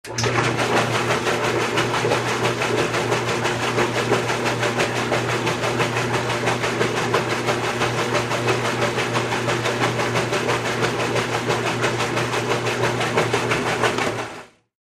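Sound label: Washing Mach Spin Cycle, washing machine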